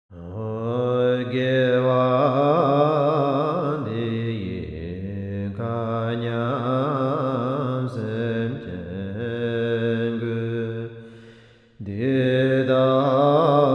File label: chants prayers guru yoga Dedication; drukpa